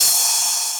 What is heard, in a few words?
cymbal